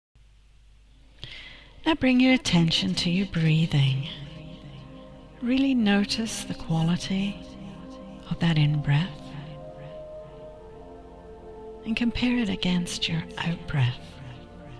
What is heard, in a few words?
Breathing